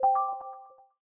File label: spring unlock; Samsung; Galaxy A5 2014